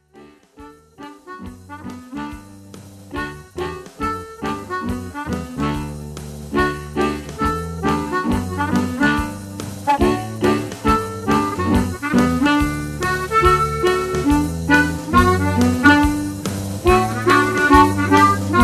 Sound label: 2 g harps